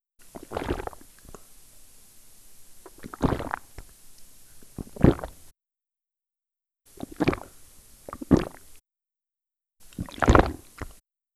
pump; Lewd SFX

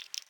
slosh

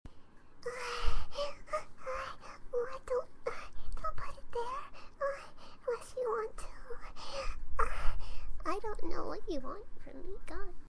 moaning; Fluttershy

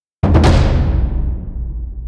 taiko drums expression, 145BPM

